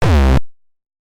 Kick Hardcore

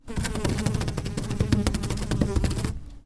Fly Going Crazy Into Lamp Shade

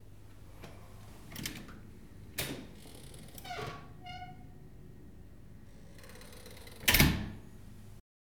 Door Open Close Metal

